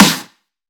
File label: bright steel snare drum g key